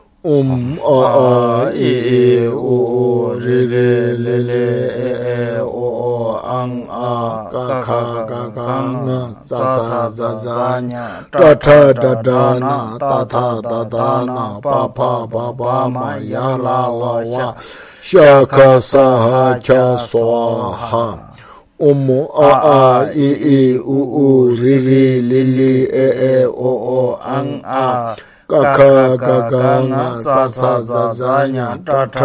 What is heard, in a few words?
Sanskrit Alphabet Mantra